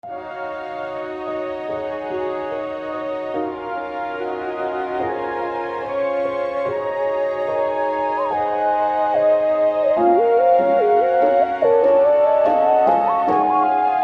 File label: plugg loop